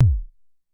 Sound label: Drum, Vermona DRM1-MK2, Kicks